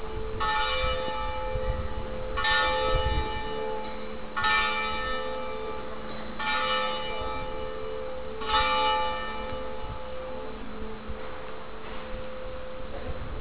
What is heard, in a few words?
clock tower n door; Pienza; Tuscany; Europe; Italy; Towns